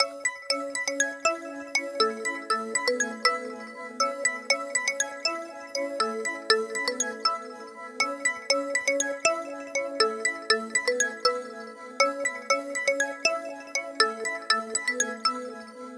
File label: Samsung Galaxy S20 FE, tono de llamada